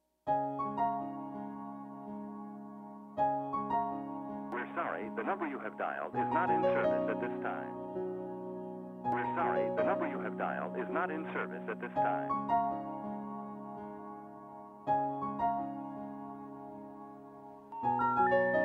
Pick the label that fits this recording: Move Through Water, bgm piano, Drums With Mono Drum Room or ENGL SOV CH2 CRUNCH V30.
bgm piano